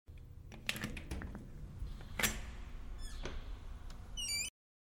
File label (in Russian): krepkaja metallicheskaja vhodnaja dver